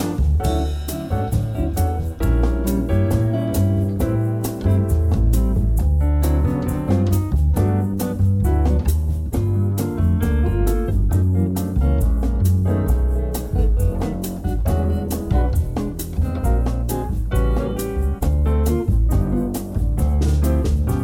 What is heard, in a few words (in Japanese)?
wave バッキング